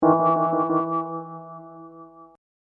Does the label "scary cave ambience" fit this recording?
No